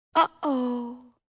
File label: fail